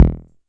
Kick Delay, TR-606 Bent Samples; Sonic Foundry (Sony Creative Software); The Electronic Music Manuscript Collection; Battery 3 Sample Kits

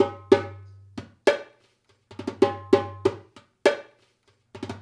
Dumbek; Essential Percussion

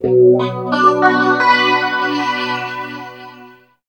GTR; East West; Phat & Phunky

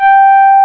FM flute; NEW AGE

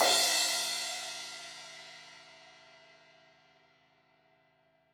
Crash 16in edge, Cymbals

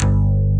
AC GTR, JV 1080; Pro-Rec - Synthsonic

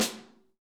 SN, Real Mega Drums; Best Service; Real Mega Drums VOL-1; SN AMBIENTEC

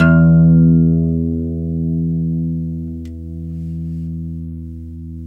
GTR 6STR E, Steel String; Roland LCDP02 Guitar and Bass

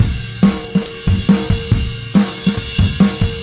LoFi Beats, 140 BPM

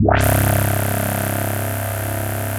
arp rezo C2, rezo bass, bass analog & synth; USB Soundscan vol.12; AKAI

